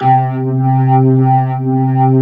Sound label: C3, Keyboards Old School; USB Soundscan vol.09; AKAI